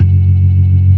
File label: ORGAN, C3 NO PERC; Keith Emerson Signature Series